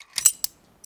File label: destroy key